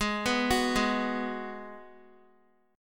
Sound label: G sharp Minor 7th G sharp, Guitar; G#m7 arpeggiated; x,11,11,11,8,x